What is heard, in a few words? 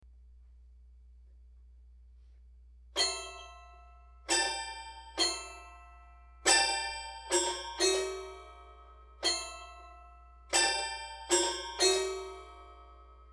Toy Piano